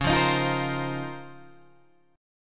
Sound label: Db Major 13th, Guitar Open G